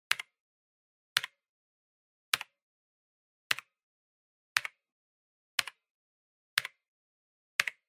PC-Keyboard (Single-Hard)

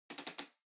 Air Circulator Far Angle

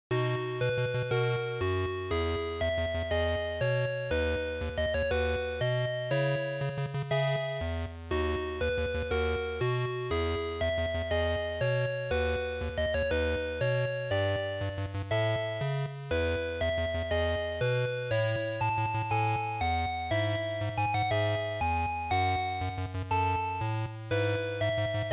GB RPG OP2

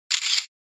Camera Phone

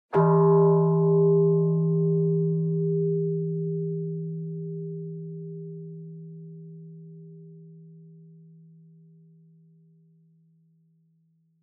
Bonsho High

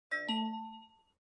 OS Sound (Speaker-Notification)

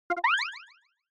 Single Accent High

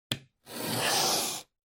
Cutter Cut Paper

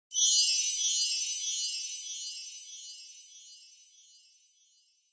Bell Accent Delay

